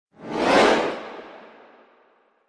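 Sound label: Grand Piano Cacophony; keyboards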